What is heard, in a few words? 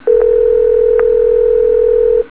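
ring tone; IAXPHONE-0.0.1; asterisk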